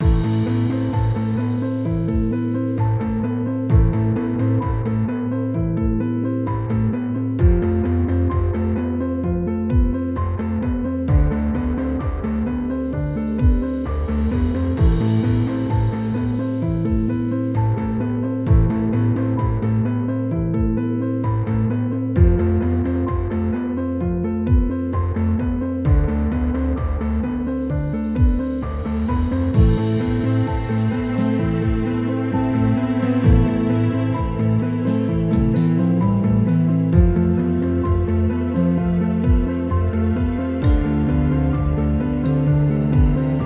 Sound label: Lobby Pause Music; Last Encounter; Lifeline